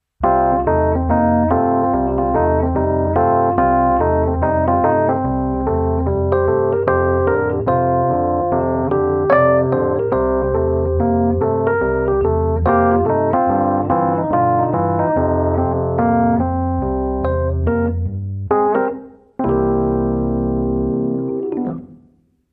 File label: Rhodes